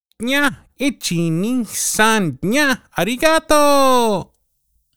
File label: Ringtone